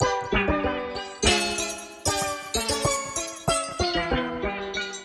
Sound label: Cartoons G Mixolydian 95 bpm; MIDI Clips; Tonal; Ableton; Factory Packs; Lost and Found